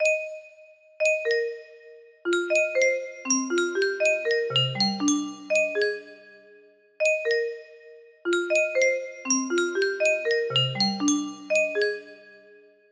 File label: melody; final fantasy x 2